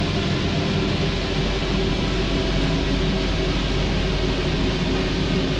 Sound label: Metro slow